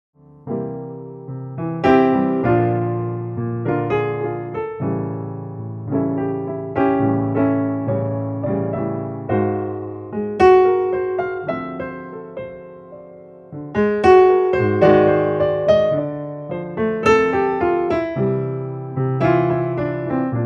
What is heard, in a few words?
Piano Lounge Late Night